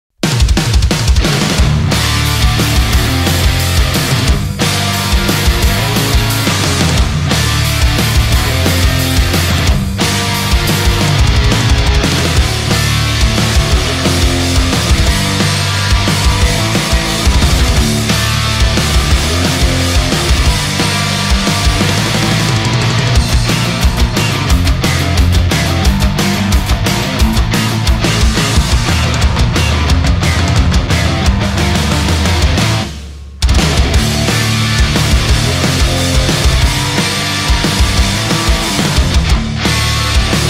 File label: Off Vocal Vers